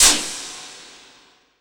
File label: FX Dance; Sound & Vision; Gigapack II CD 2 (Roland)